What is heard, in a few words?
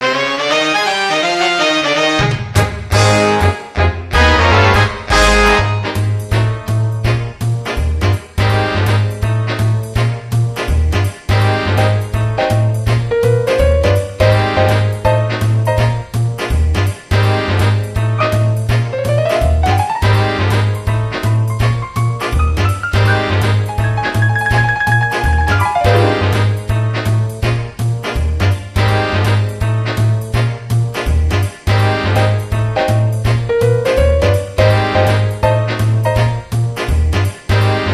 BGM; Mahjong Taikai; PSP